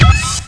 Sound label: PICKUP; NIHILIST